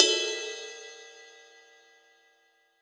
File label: Ride Bl, Roland SPD-S